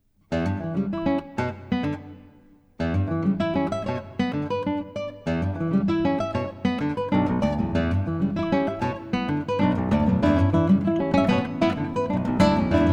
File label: Acoustic Instruments